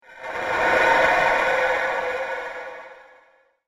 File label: Mysterious Transition Ambient Whoosh; Transition Sound Effects; Andrew Fleming